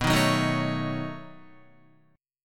B 9th B down Guitar; B9 strummed; JGuitar

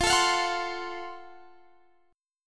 Gb Minor Major 7th Flat 5th, chord

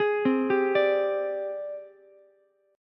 Ab Major Sharp 11th Ab down Ukulele C6; AbM#11 arpeggiated; JGuitar

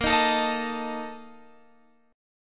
Bb Major 7th Suspended 4th, Guitar, Open F; BbM7sus4 strummed

